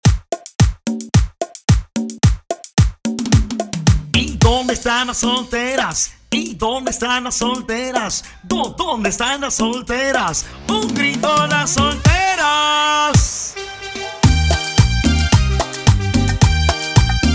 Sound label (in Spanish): animacion 110bpm; Latin beats